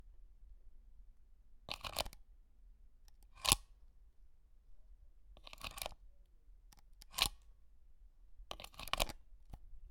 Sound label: Wall Plug